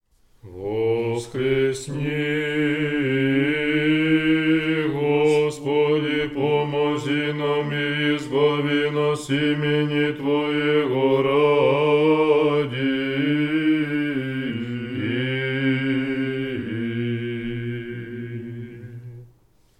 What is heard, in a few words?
prokimen tone 3